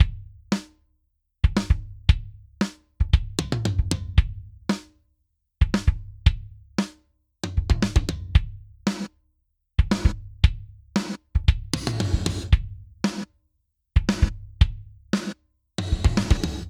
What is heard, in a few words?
80s Gated Mixverb Drums